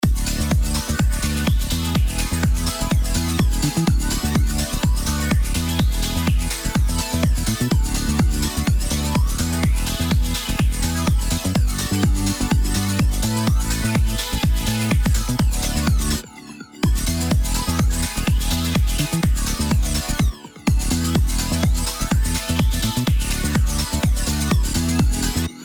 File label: Key Funk Phaser